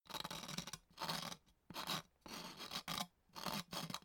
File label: scratching